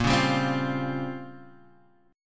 A sharp Minor 6th Add 9th A sharp down Guitar Half Step Down, chord; A#m6add9